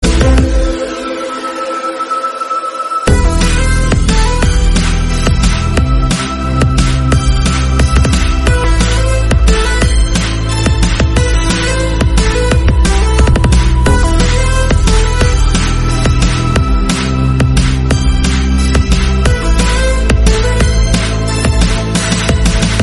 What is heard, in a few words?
background music theme; free fire